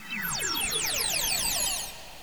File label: teleporter start; cirnogodot